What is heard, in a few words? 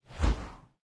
axe swish; smite; chaac